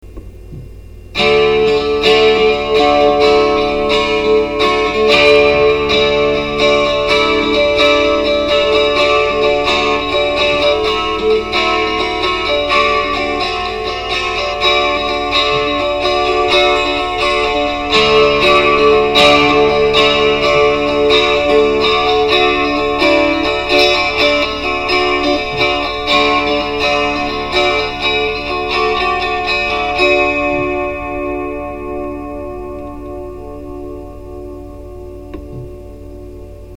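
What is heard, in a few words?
sample of drones strumming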